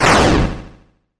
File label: dash hit; shiv; deltarune flowery over shiv abilities